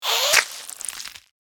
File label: Vampire bite aggressive attack